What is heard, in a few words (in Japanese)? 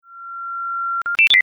人工ウグイス, ホーホケキョ; 鳥の声研究室; ウグイス VS 人工ウグイス（ホーホケキョ）